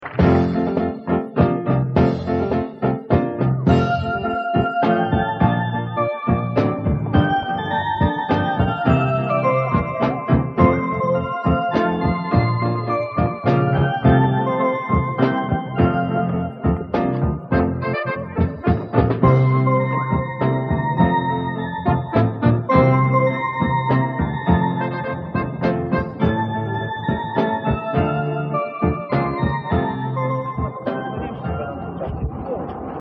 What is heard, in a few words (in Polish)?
instrumental; tytulowa